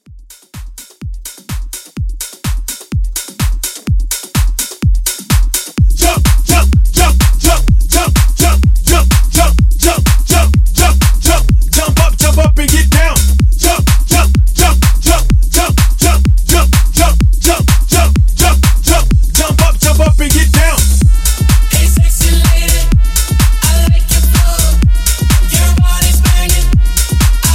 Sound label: Club Hype Reboot 126